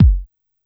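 B1 Analog Bass Drum Dance, Yamaha PSR-E323 Dance Kit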